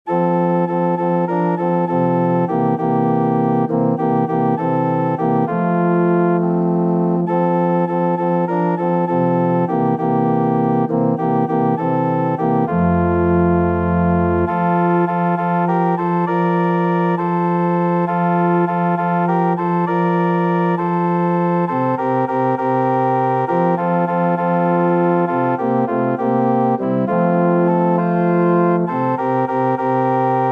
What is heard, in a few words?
organ